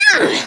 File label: Grunts Female; Kelly Slater Pro Surfer; macOS, Windows gamerip